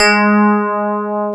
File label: PIA DX E-PIANOS